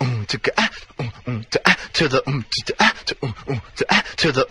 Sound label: mmm chigga ah to the, Beatbox, Drum loops